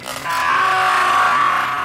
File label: weird death sound; battlefield 3